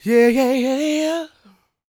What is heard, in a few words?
SOUL, Vocal Planet, Blues & Soul; CW SOUL